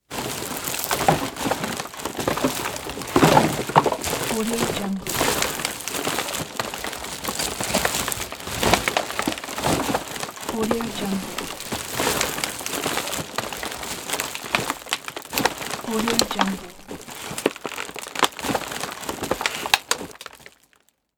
digging through the garbage

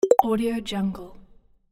text notification

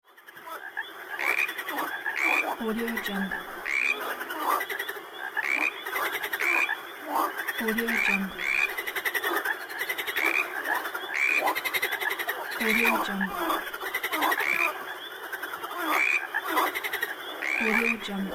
frogs

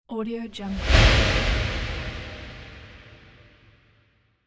impact

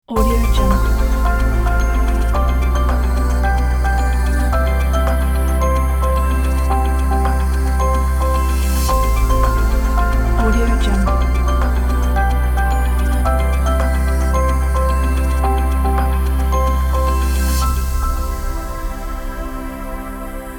inspirational corporate ident

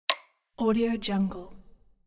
ding; magicsoundflower

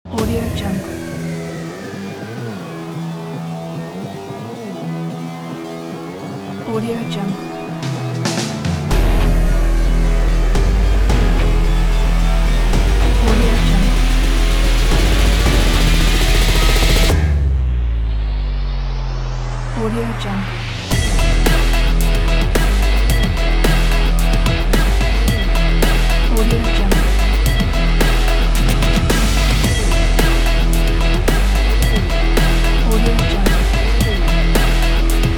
extreme sport action

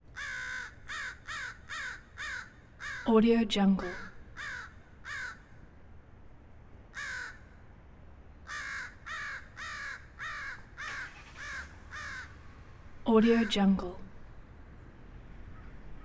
crow caw distant circling traffic; prosoundfx

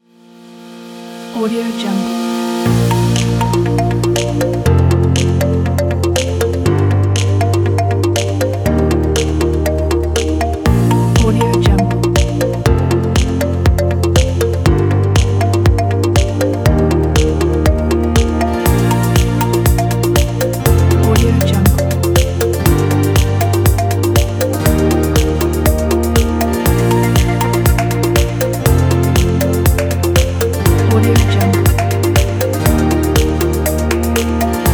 ambient short motivate

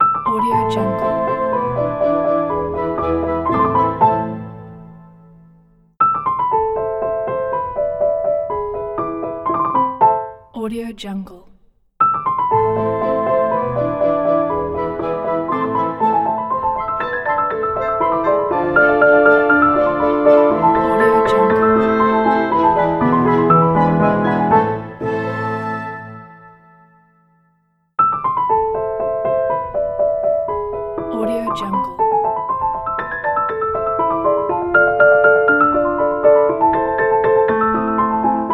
classical piano orchestra logo

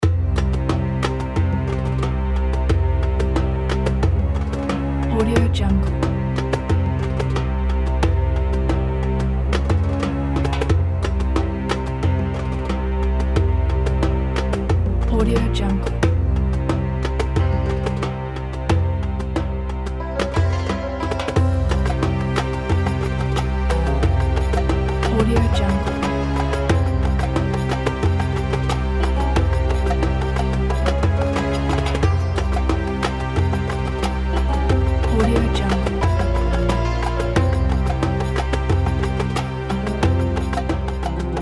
arabian city

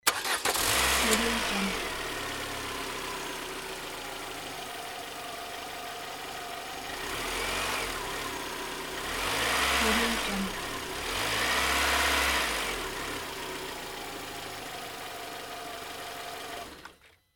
car engine start

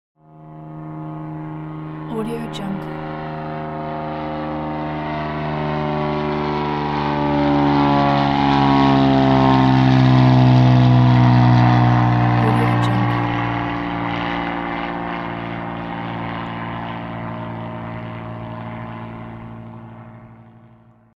small airplane flies past

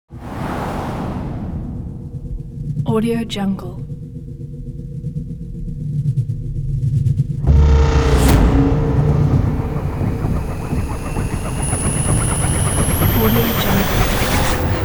horror logo; kipaloops

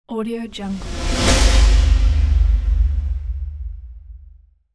intense woosh; federicosoler

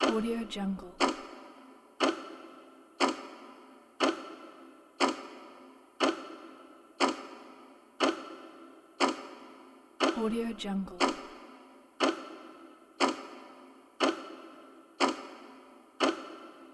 clock; blackdragonfx

